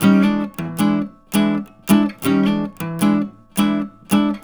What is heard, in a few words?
Blues Guitar Samples, riffs; ILIO; Fretworks